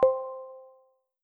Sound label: Cheers; iOS 17 Tones; Text Tones